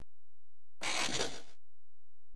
Lottery Machine; MMBN

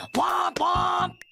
WOMP WOMP

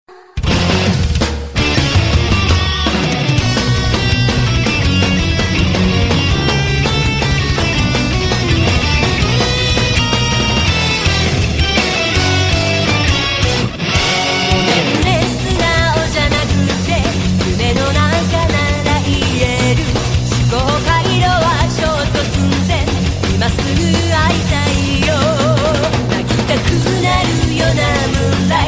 Sailor Metal

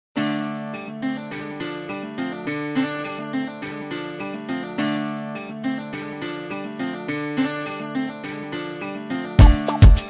Music

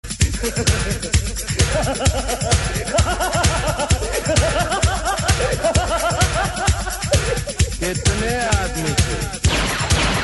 Laugh; Gabbar Sing

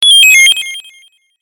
Very loud notify

